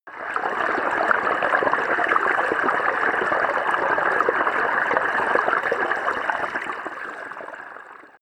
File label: bathtub fill; haus garten; badezimmer